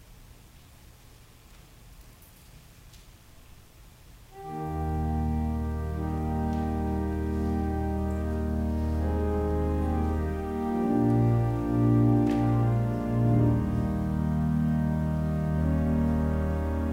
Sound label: Organ Music